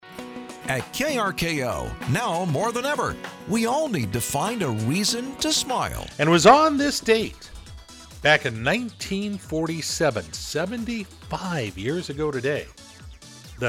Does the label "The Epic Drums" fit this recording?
No